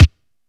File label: crispy kick one shot e key, steel kick drum sample tuned to the E note; kick drum samples